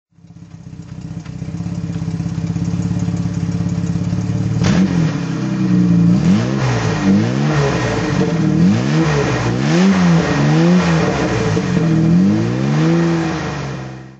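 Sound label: jaguar xk150 dhc throttle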